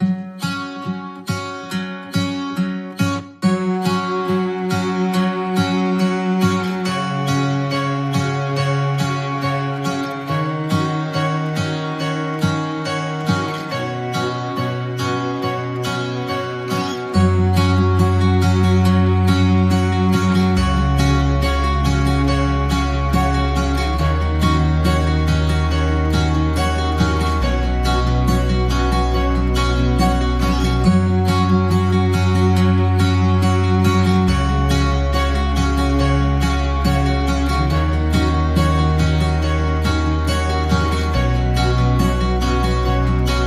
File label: Joyful Acoustic Christmas, No Drums No Melody; 60sec